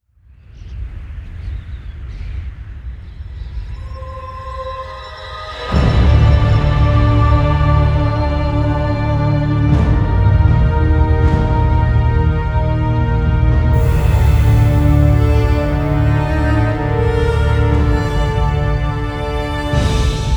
Round Start; Zombie Der Riese

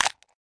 Chomp Slow; lego my world first steps

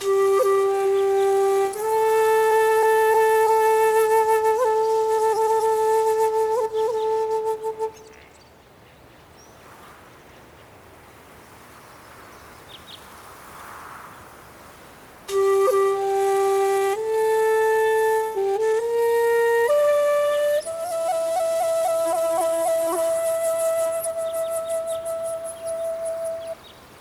Yomi Village III (the Lone Shakuhachi); Akaneiro Demon Hunters Original Soundtrack Part One

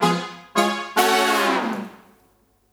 Victim Rescue

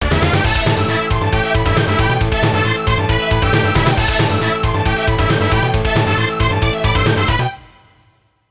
Mission Complete

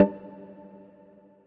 Fail; windscape switch windows xbox one gamerip 2016

